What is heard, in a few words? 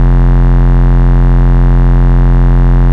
Root F, Bass